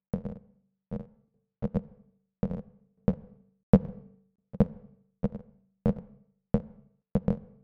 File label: synth wood plus reverb; The NIW Project